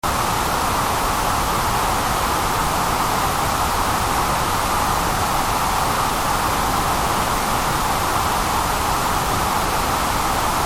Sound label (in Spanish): centrada en 1000